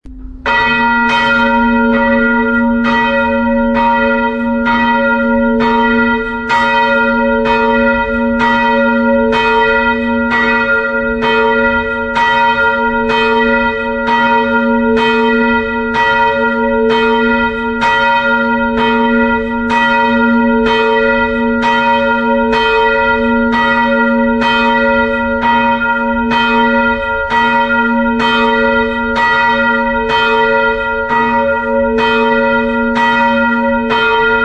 Tonaufnahme b1 325kg, einzelglocken; 1923

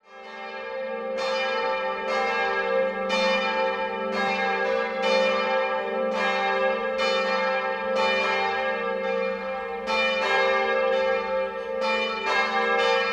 Tonaufnahme g1 a1 Simulation, glocken; mehrstimmige gebrauchte Geläute; Simulation PE

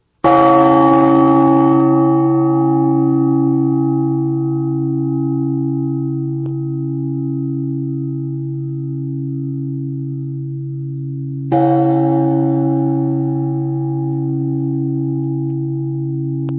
Tonaufnahme cis1 2089kg

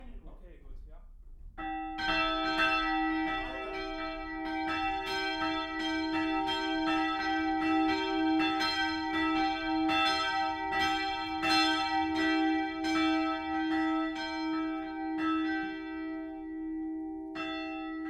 e2 g2, glocken; Mabilon 1993

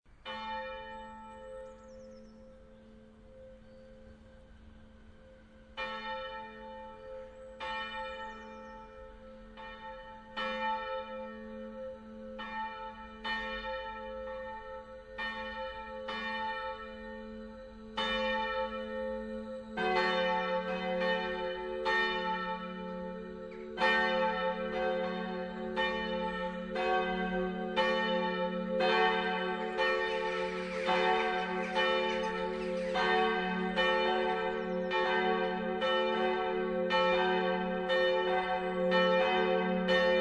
Tonaufnahme e1 fis1 a1; mehrstimmige gebrauchte Geläute

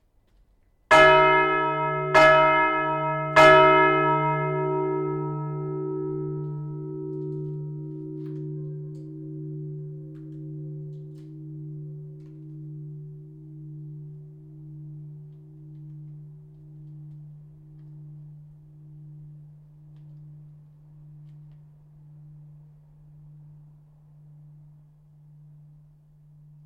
Tonaufnahme es1 Anschlag, einzelglocken; 1926; Schilling

